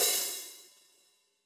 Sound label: Hats Open, drum